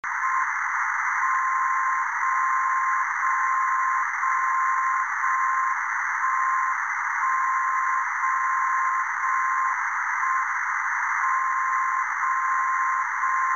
60khz omsk

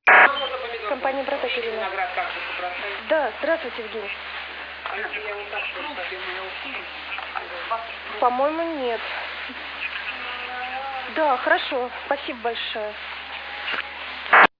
SATCOM

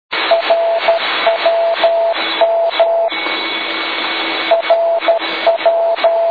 rwm

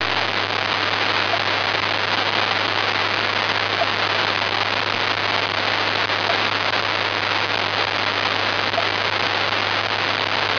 signal

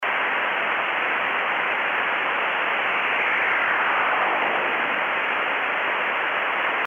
interference

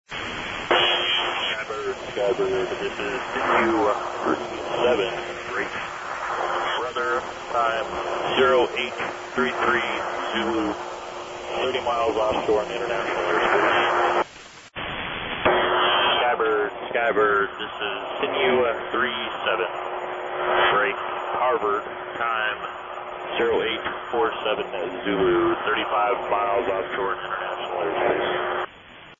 sine

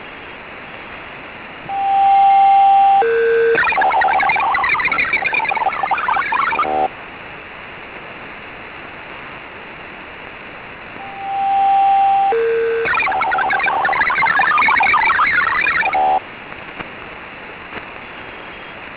mfsk-16

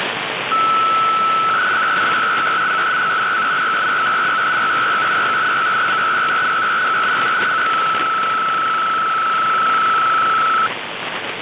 usb baudot-200bps; 102475khz

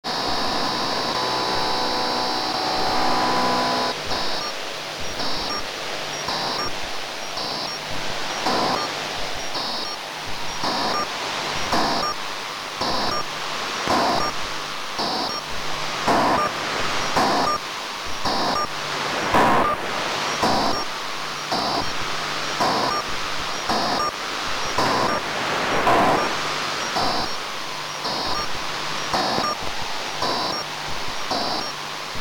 4515khz signal

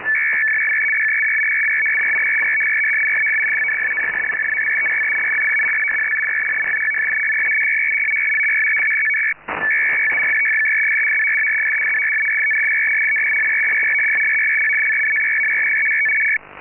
9033 FSK

